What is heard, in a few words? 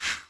level change; chroma solstice